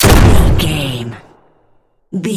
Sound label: gun shotgun sawed off shot